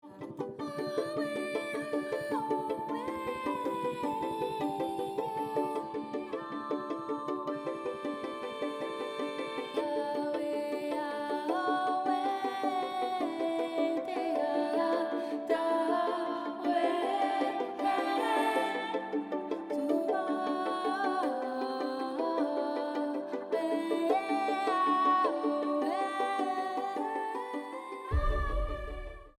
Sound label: World Vocals